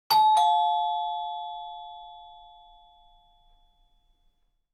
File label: melodic classic door bell